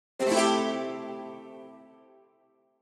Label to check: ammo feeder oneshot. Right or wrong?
Wrong